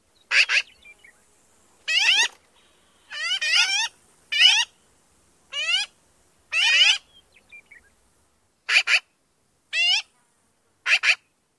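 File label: Black-billed Magpie various calls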